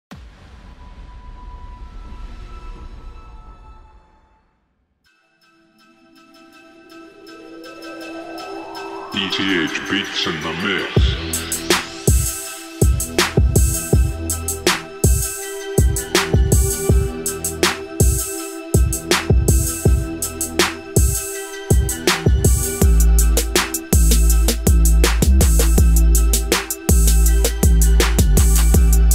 Hard Energetic Piano Trap Rap Beat New School Instrumental